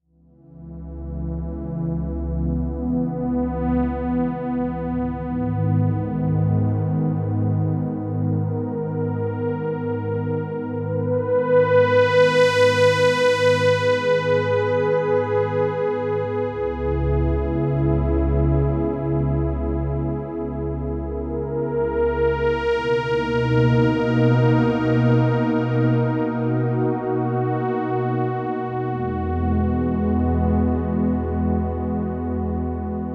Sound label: dw8000 pad solo